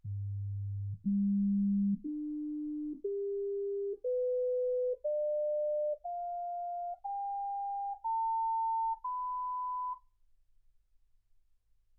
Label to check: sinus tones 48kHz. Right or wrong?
Right